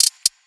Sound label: hat; Hats